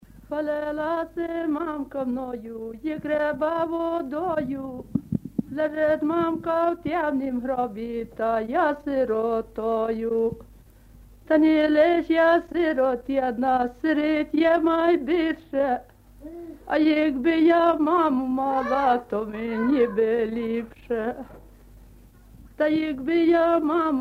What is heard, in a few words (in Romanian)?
Cantecul orfanei in ucraineana; jocuri si cantece batranesti; Vaslui